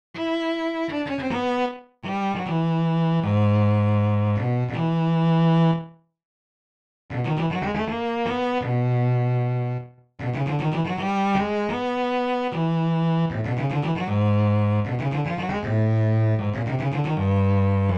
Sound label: Bass; Strauss; Don Juan